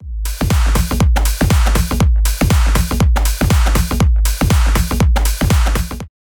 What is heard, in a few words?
Rapid Dance Constructed; Parawave